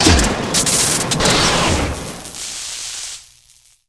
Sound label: evil machine dead, monster